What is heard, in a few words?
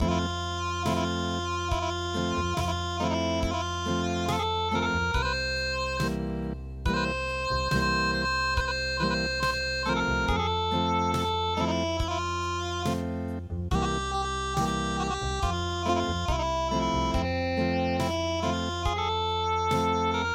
Acoustic, traditional; Tsuboya No Toukkiguwa